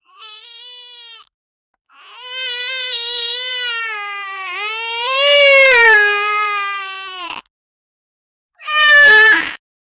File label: child crying